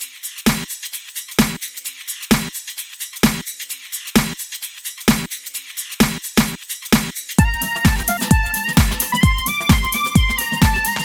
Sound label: 128 BPM